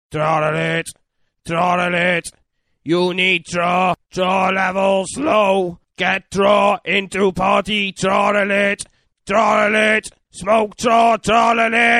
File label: Alert